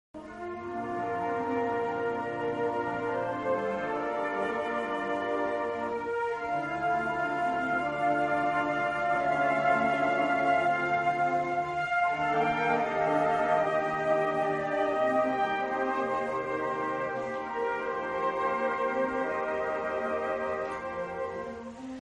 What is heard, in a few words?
concert, flute